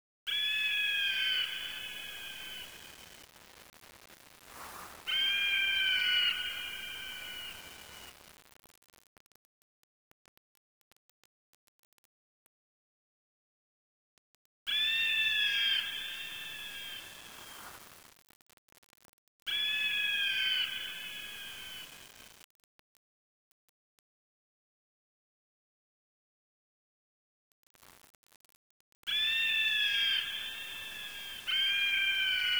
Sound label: ambience